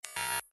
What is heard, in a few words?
hover welcome lang